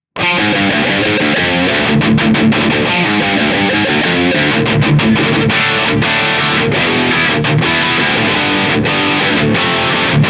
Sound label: metal; jn12-100t-spec